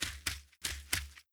Majestic Lion Walk

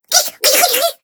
Angry; Kebing; Gamigo

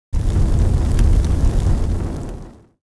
fire Obstacles skill; Seer; GamigoNA

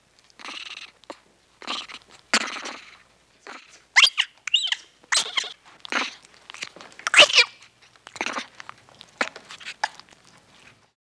Monkey Idle

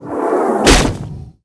Book Atk; Marvell; Gamigo

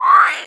Mage Book Atk; Seer; GamigoNA

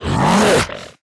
Goblin Captain Atk; Seer; GamigoNA

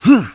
Torturer Atk

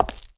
Foot; Wishis; Gamigo

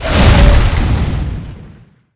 Goblin Captain Atk Skill

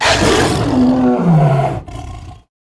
Spake Dog Die

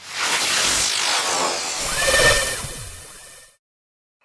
Skill Tomahawk

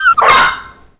Psykendog dmg; Seer; GamigoNA

